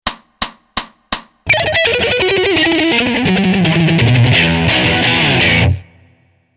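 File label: Arps with Chromatic Embellishment fast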